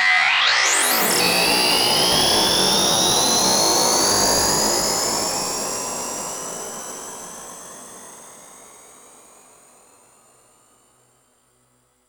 Uplifter

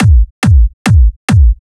Kick 4_4 140BPM; Drums & Perc; Vengeance Samples; D minor

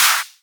Claps; Vengeance essential club sounds-3

